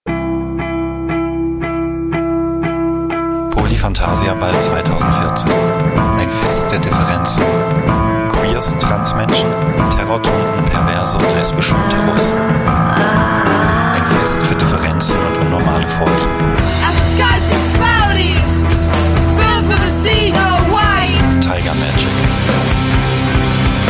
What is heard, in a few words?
jingle; polyball2014